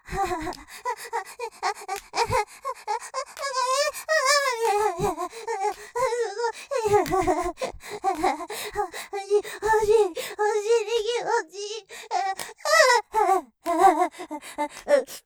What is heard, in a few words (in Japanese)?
喘ぎ◆すごい:すごいお尻気持ちいい；; ステレオ